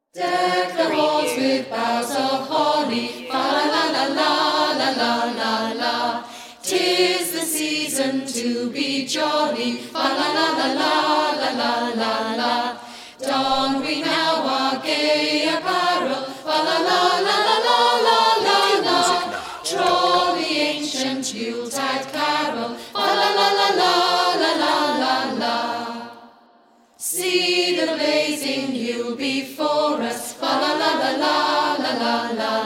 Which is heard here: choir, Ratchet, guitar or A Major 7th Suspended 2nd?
choir